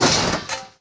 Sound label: Horseman Fall; Playable Tutorial Level